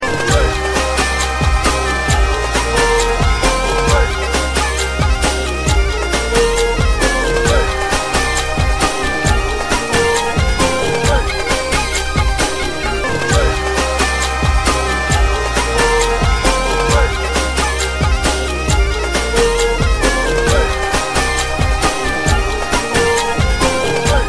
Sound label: Flute